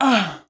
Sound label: Push; Lara; Playable Tutorial Level